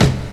Kick Snare OS; Sonic Foundry; Rondo Brothers Trip-Hop Loops & Samples; New York Kid

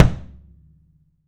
FELT KICK; 3D Sound; 3D Drums

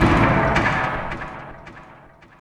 END, Tekno Industrial; East West

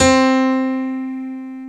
PNO, SY PIANO, House; Best Service ProSamples vol.10; AKAI

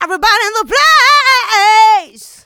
Female Vocals; Houseworx

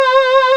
VOI FEMA, vocoders; Sound & Vision - Gigapack I CD 2

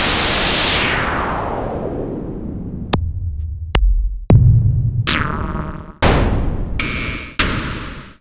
Yamaha CS15D

